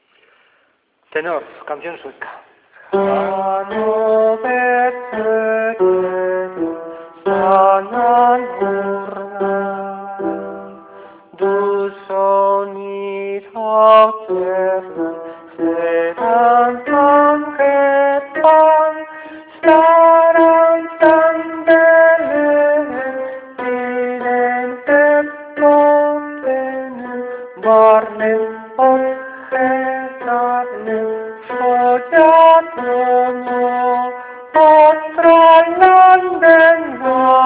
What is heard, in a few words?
tenor